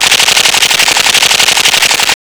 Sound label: uzi